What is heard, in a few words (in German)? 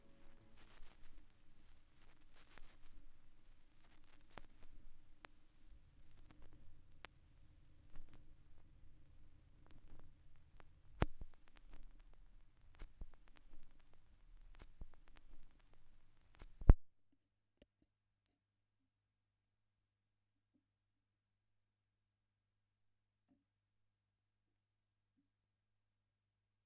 schallplattengeraeusche